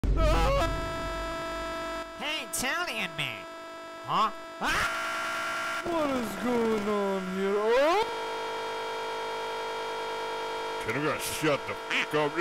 ahhhhhhhhhhhhhhhhhhhhhhhhhhhhhhhhhhhhhhhhhhhhhhhhhhhhhhhhhhhhhhhhhhhhh